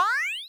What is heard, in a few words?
Jump